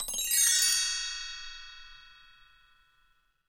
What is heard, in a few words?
BTREE, Bells Chimes; NorthStar - Global Instruments VOL-2